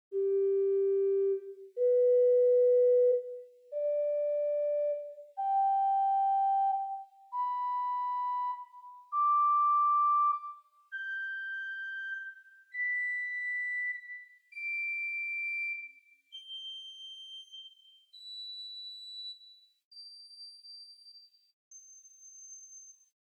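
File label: Great Quinte 1-1.3 arpeggio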